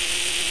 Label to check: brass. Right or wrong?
Wrong